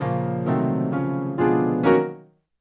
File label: Piano, Acoustic Pianos